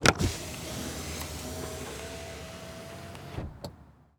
Transportation Car Monte Carlo Exterior Power Window Roll Down